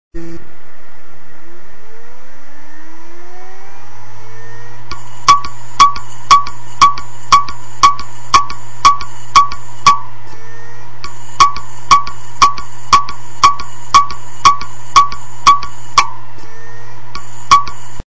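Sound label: wd loud clicking, Hard Disk danneggiato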